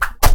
shuriken ninja knifes; jidaigeki

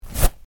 tissue

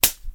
snapping; human

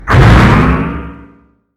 robot punch

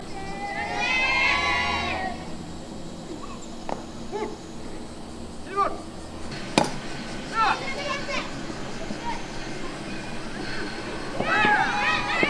baseball boys; sports

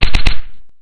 punch; attack

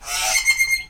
tropical bird; 南の島の鳥１; animals

